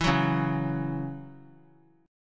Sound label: C sharp Minor Double Flat 5th; strummed; x,23,21,23; 3C,3F,3A,3D